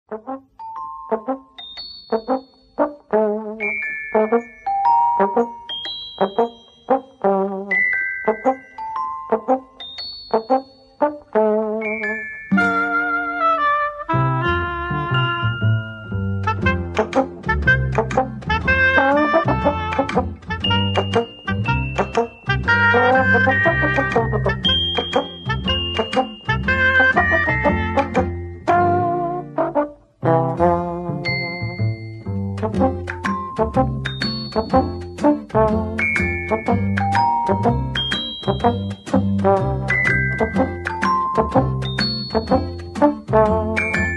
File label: Theme Tune; Flumps